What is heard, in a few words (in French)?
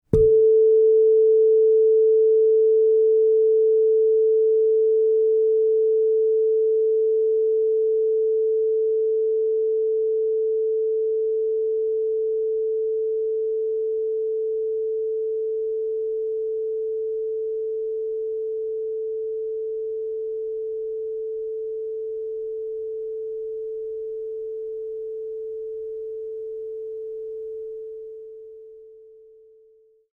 diapason la 440